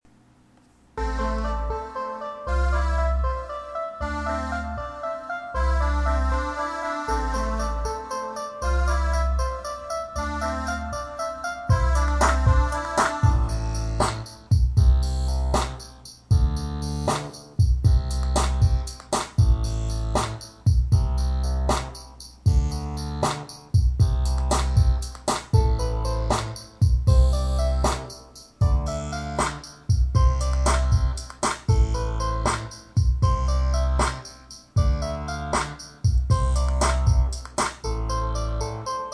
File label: sunday afternoon beat; dafmusic